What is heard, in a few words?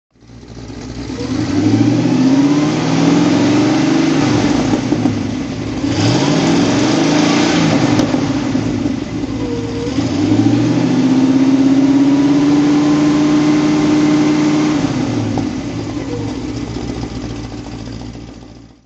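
chevrolet impala coupe throttle, engine sound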